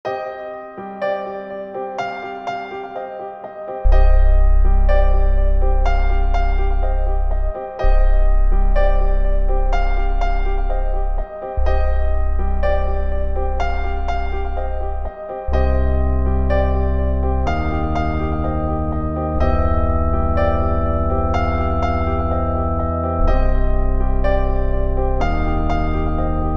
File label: zombie music